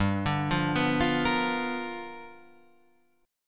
G 7th Sharp 9th G, Guitar Standard; G7#9 arpeggiated; JGuitar

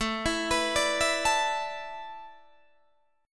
A Suspended 2nd Suspended 4th, Guitar, Open D

